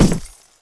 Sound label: FOOT